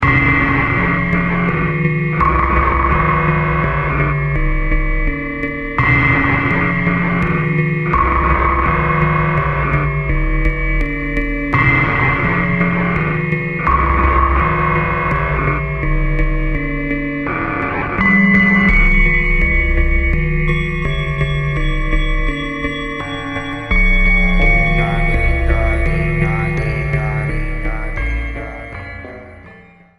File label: Theremin; atelier Theremin